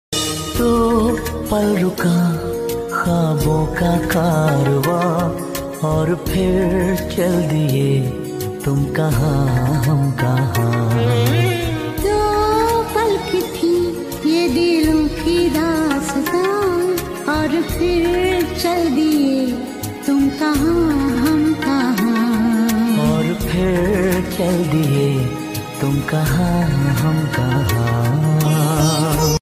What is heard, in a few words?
efx, hindi sad song